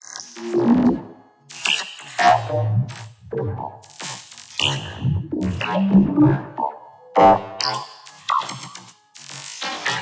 texture contaminate

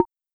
Bongo Mi, Roland TR-33

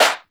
619 GRVD, Boom da Drumz, Grooved; E-MU Producer Series Vol. 6 – Analogia Project

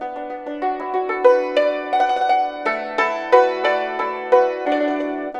YNCHIN 90 D; INIS - Opium

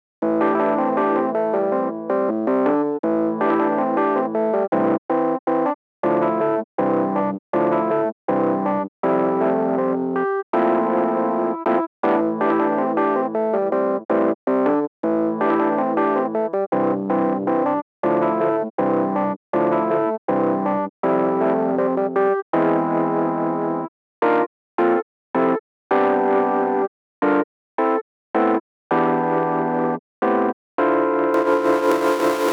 epiano

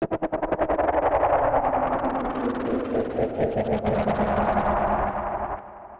Atmos Sting; musicradar

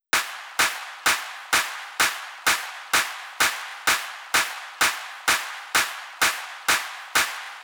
Clap crowd, Drum Loop 128BPM; VEC5 Drumloops; VEC5 Multi Loops 128BPM; Loop Elements

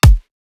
FX KICK

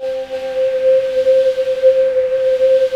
hollow pads; Pro-Rec - Synthsonic; Z1 PADS

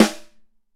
Wood Snr; Digital Sound Factory

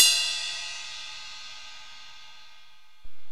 CYMBALS; OMI - Universe of Sounds

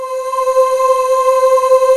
CMI CHORUS, PADS; Rubber Chicken Software Sample Bank; REAL INSTRMT

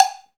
COWB, Cow Bells, Roland

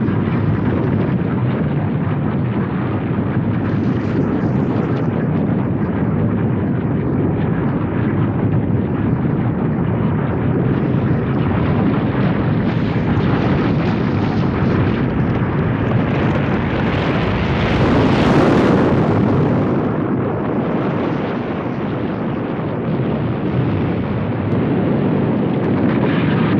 FX avalanche; Sonic Foundry (Sony Creative Software); Ugly Remnants VOL-2; UR 2 FX (Long)

